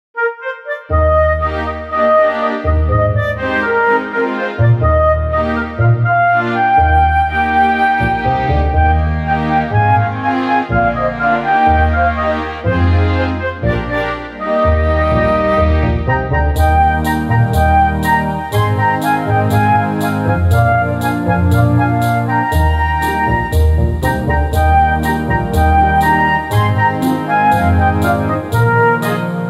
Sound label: GuitarMusic